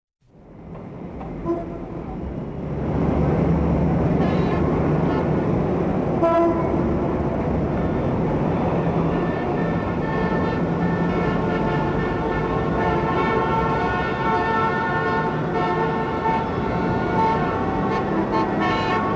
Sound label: horns in traffic